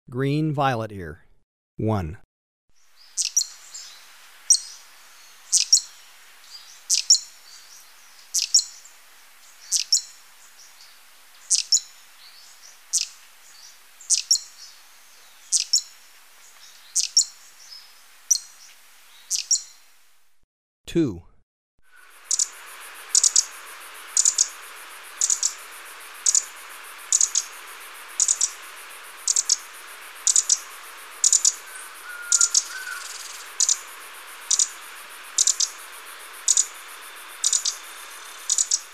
Green Violetear; S. America

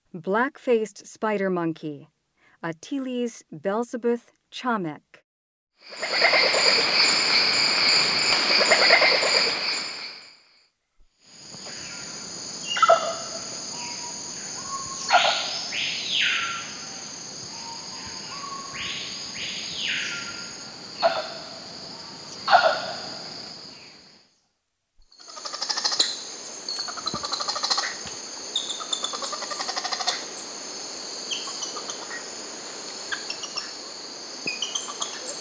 Black-faced spider monkey; S. America; Rainforest

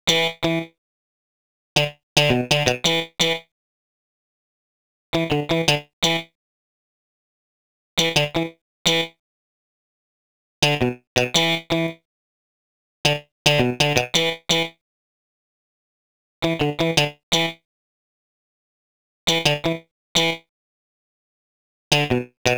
Guitar, RnB

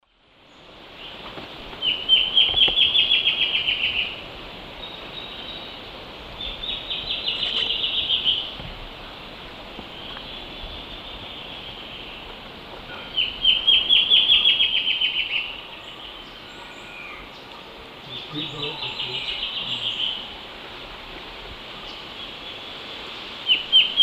Hypocnemoides melanopogon, duet; S. America; Eastern Lowlands

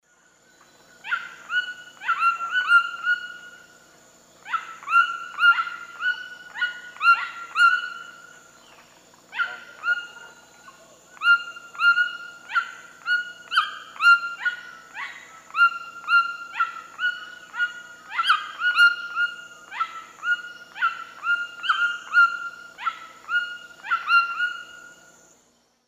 Ramphastos tucanus, duet; S. America; Eastern Lowlands

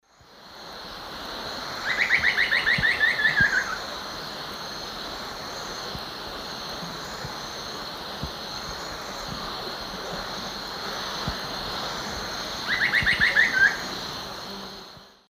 Dendrocolaptes certhia, song; S. America; Eastern Lowlands

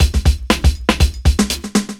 chemical 120, loops, 120bpm

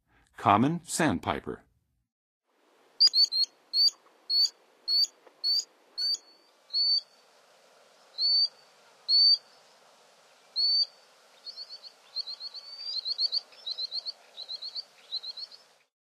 Common Sandpiper, Birds of North America; Western USA; Western USA Songbirds

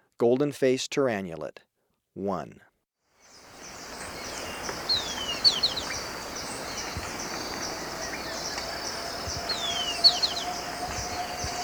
Golden-faced Tyrannulet, Birds of Ecuador; S. America

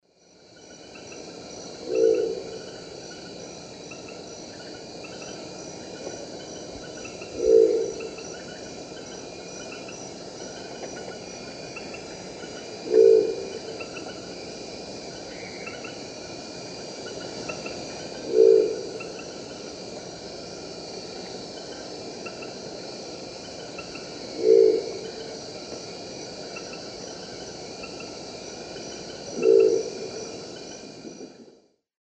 Lophostrix cristata, song; S. America; Eastern Lowlands

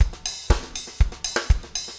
4 on floor 120, loops, 120bpm

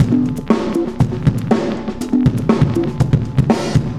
cooling 120 bpm; loops

